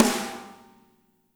MED SNR, SLAM KIT; Spectrasonics - Burning Grooves CD2

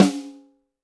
Snare, Roland TD-20

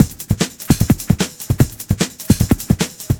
processed acoustic drum kit; FRACTAL INFUSION KIT

